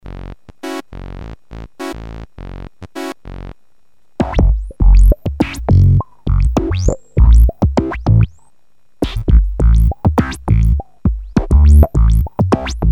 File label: Funk